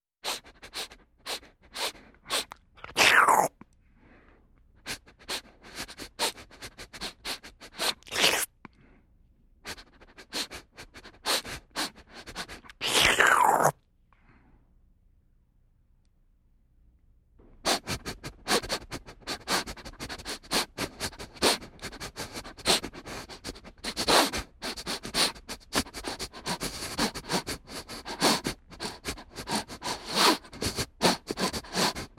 Food Smelling and Slurp

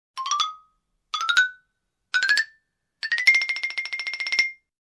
Funny xylophone cartoon climbing sound

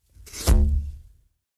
Sucking sound

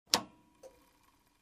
Closing office desk compartment

